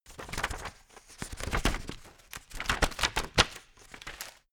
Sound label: turning page